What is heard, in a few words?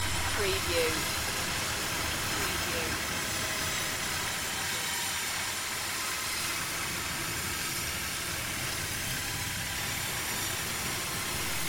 dimension flutter hiss loop